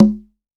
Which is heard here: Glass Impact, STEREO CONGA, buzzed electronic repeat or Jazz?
STEREO CONGA